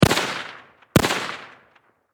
Rifle .30 Caliber M1 Carbine Semi Automatic Double Shot Distant Perspective; Warfare